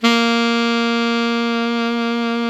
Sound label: SAX ALTO MP, Alto Tube; Roland LCDP07 Super Sax